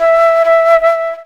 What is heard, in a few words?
D.FLUTE; E-Lab; X Static Goldmine 2; akai